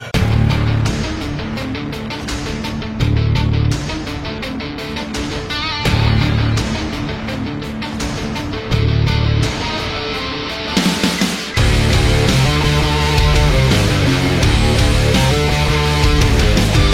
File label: Rock